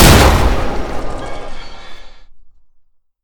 propulsion fire; deluge; weapons v3

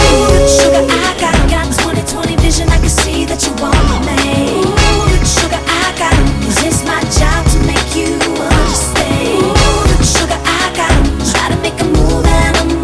music; Nice-Aim Halla

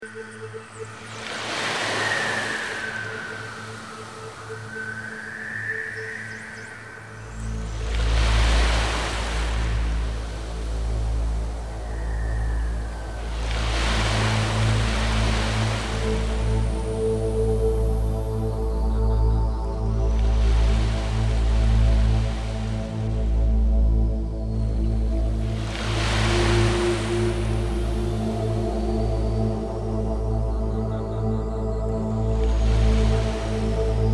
Ocean Trance

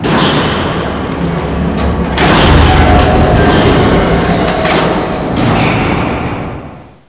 door

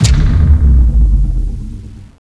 bunker blast; xwire

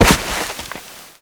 coco fall; deathrun tropic

